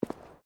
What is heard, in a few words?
Footsteps womens low boots concrete step; Footstep Foley; Human